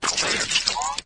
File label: move, flood infection